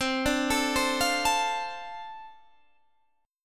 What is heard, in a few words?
F 6th C, chord